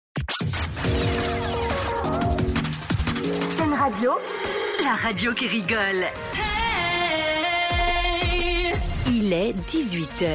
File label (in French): Radio Top H 18h Avec Cage